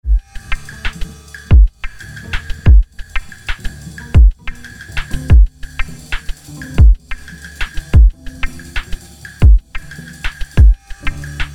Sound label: beat